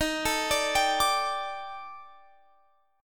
D sharp Major 7th Flat 5th, down, chord

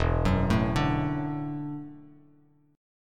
E 9th Suspended 4th E down Bass Standard; E9sus4 arpeggiated; JGuitar

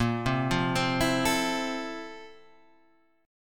F Major 7th, Bb; Fmaj7/Bb arpeggiated

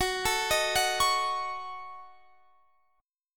D sharp Minor 7th Flat 5th F sharp

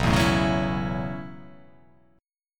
B 7th Suspended 2nd Suspended 4th B down

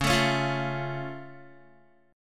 C sharp Augmented down Guitar, chord; strummed; tuning=4E,4A,5D,5G,5C,6F

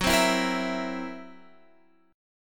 Fsharp 7th Flat 5th down Guitar Half Step Down

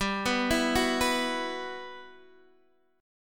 E Suspended 2nd G; Esus2/G; arpeggiated; JGuitar

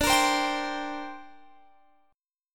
C 7th Suspended 2nd

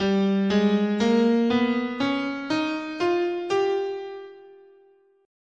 G Altered, scale

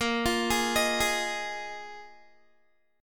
Bb 7th Suspended 4th Bb Guitar 4E,4A,5D,5G,5C,6F; arpeggiated

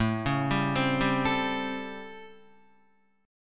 A 7th Sharp 9th A, Guitar; arpeggiated; guitar chord

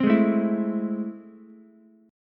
A Minor Major 7th, down, Ukulele; strummed; AmM7; JGuitar

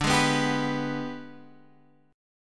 G sharp Minor Major 7th Flat 5th

